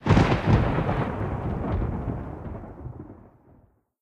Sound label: scenario thunder; spider-man-mysterio-rush-game